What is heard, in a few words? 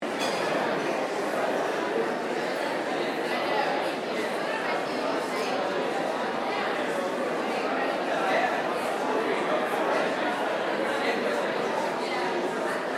AMBIENCE CROWD INDOOR EVENT AUDITORIUM; Crowds